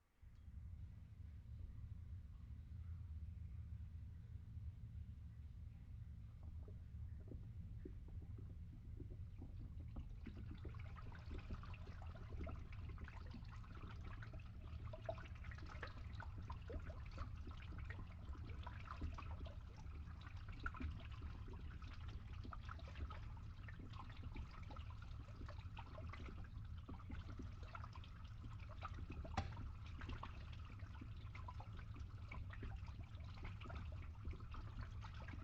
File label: water drains in pipe with pump